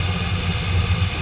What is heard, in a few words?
houses heatlamp; Tony Hawk's Project 8; XBOX